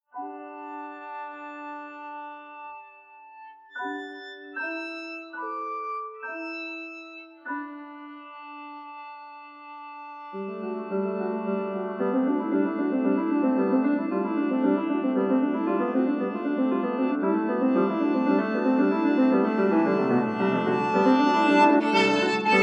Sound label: Concerto-Duo for piano and violin - Eclogue; Юдина; Наследие Марии Юдиной; Стравинский